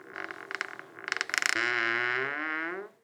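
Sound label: wooden door creak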